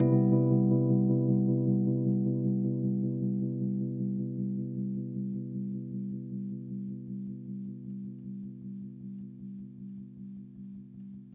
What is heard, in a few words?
E-Piano CMaj7; Piano & Keys